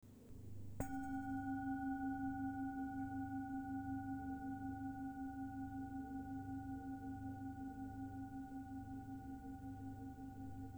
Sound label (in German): Antike Klangschale